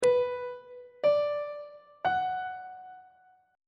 a melodic minor chord 2 is b minor on treble clef

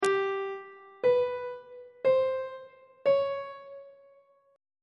a double flat 4th interval pitches on treble clef

